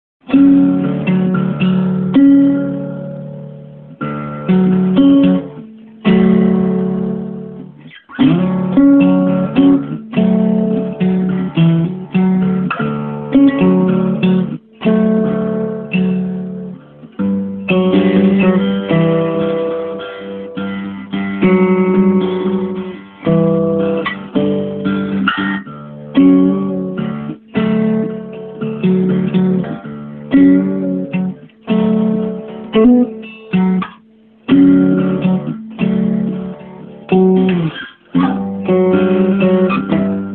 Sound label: instrumental